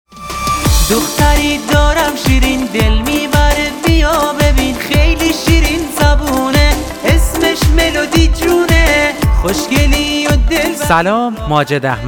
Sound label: Melody; silver melody birthday آهنگ تولد ملودی